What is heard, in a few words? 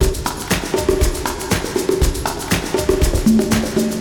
Drum test wet; Nimbus Station; lego universe macos windows gamerip 2010